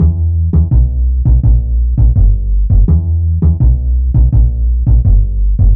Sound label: Fat Upright, Bass Lines 166.5; Zero-G Jungle Warfare 1, 2 & 3; 90 s Sample CDs